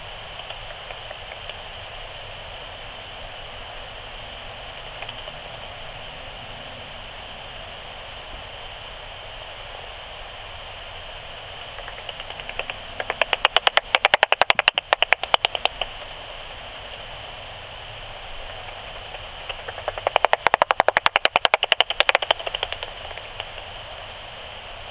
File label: back garden common pipistrelle 45kHz; bat